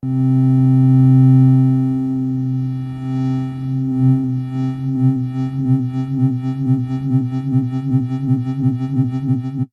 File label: Phaser